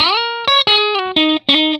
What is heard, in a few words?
RIFF 120, Vintage Blues Guitar; USB Soundscan vol.22